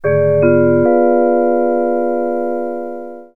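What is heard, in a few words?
suspense, music drama; KABOOM! - Wild and Wacky Sound Software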